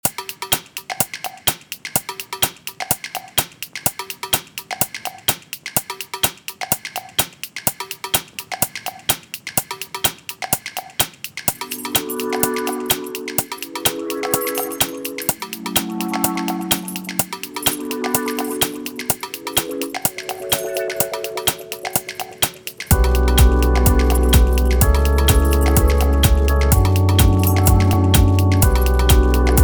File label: 126 lofi house; groovin type vibe